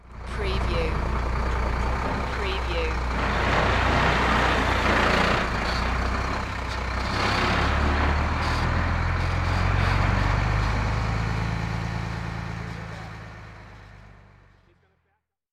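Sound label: Dump Truck Drive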